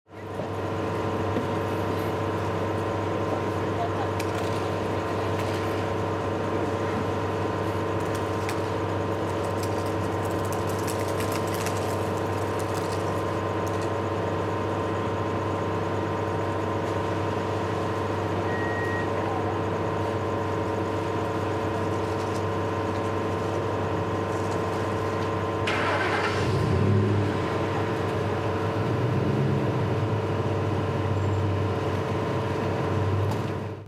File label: Fish market ambience exterior